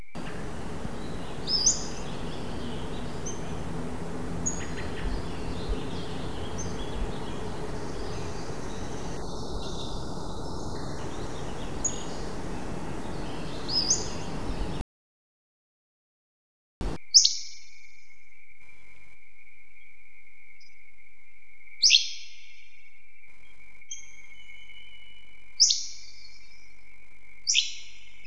Pacific slope fly catcher vs. Hammond's flycatcher